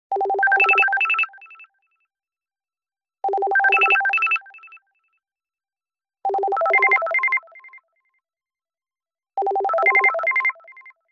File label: Poly Echo, Business Rings